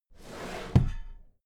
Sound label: drawer cabinet equipment close regular halfway